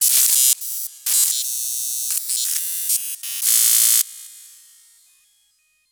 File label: cyber computer process spark